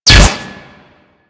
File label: Bolt Impact Metal; Warframe; Weapons; Cross Bolt